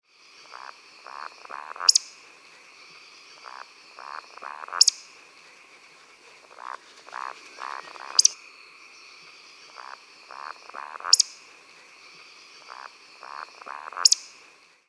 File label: Scolopax rusticola